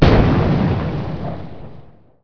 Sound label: xplode; PC Gamer IT CD 41